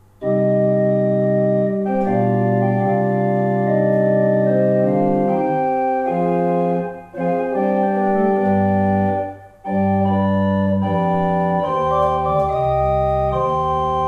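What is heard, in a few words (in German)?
Vierdag 6 I, pfeifenorgeln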